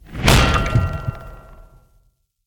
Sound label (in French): mauvaise reponse